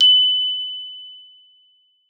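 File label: glockenspiel F#5; Xsample VOL-4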